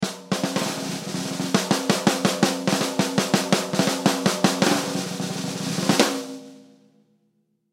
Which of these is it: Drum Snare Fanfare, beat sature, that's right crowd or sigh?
Drum Snare Fanfare